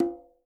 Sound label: Quinto, Percussion; Sampleheads; NYC Percussionworks